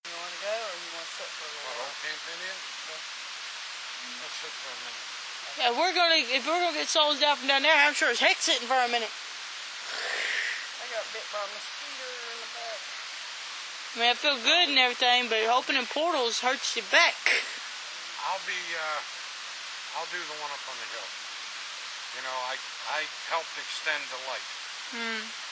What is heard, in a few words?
growl